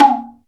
tsuzumi L, Ethnic Percussion

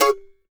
MANDO C# MAJ L; Sampleheads; Whole Lotta Country 1 & 2

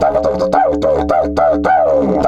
108, Didgeridoo; Big Fish Audio - Didgeridoo and Other Primitive Instruments

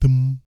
BASS THUM; Spectrasonics; Vocal Planet; Jazz FX

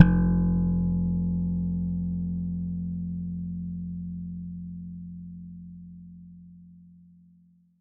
Electric Bass, F1; Polyend; Simulacrum Sounds; 1 Shots; Acoustic and Electric

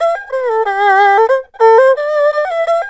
ERHU 90 C; INIS - Opium

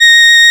REED A#; Big Fish Audio; Synclav FM

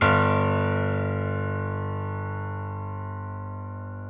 PNO XYPNO, Grand Pno, Roland; Club-50 - Foundations; Grand Pno 3

